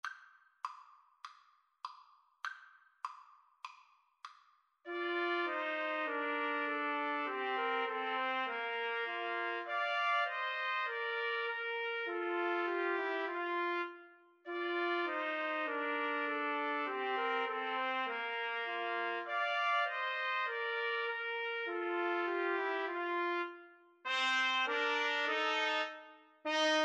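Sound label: melody trumpet, karaoke; Trumpet Trio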